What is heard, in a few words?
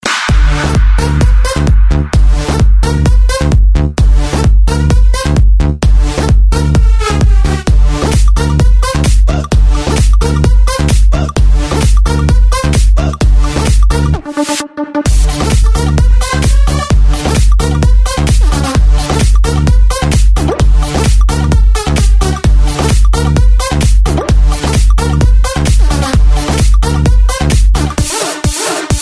electonic ringtone